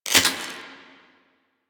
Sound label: Omicrus Quick Draw Mech; Warframe; Quests; The New War; Weapons